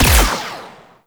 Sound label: Energy Ball Fire; Warframe; Corpus; Mine Osprey